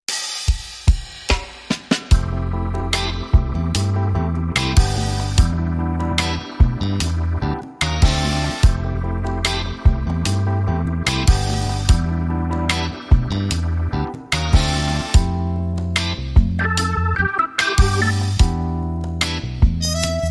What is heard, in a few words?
No Horns